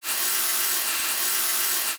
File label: saw quiet; niosh; buy quiet